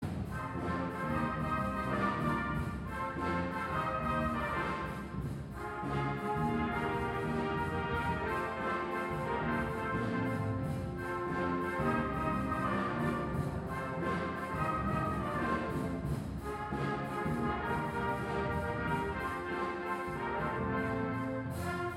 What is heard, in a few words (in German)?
Blaesergruppe Big Band; Musikdarbietungen bei der Kunstnacht